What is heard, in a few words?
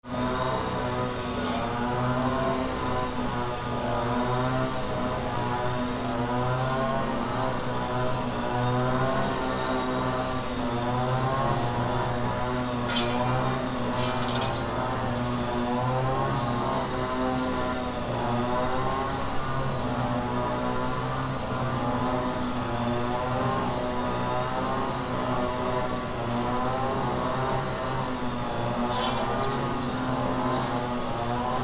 mowing